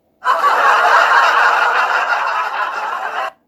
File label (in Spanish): risa; tiktok